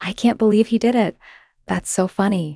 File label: breath